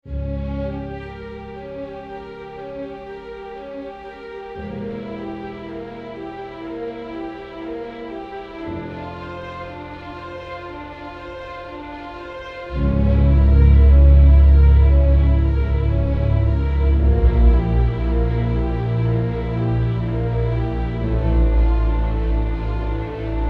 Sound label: Cinematic samples